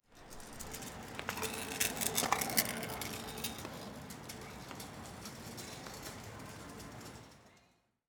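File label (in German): ROLLER, Stadtgeräusche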